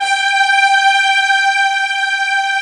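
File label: bright strings G5; BRITESTRG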